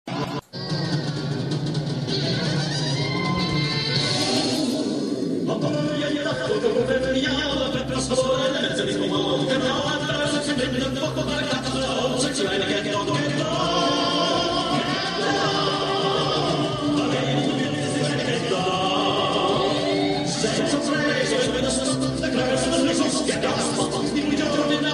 cat dog chant; cave

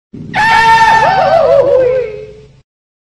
goofy yell; cave

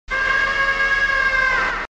scream

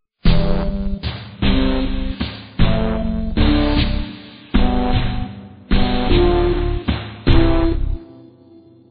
oh well music; vapesquared

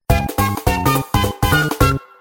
oh well music

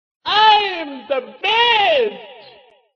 toad sleep

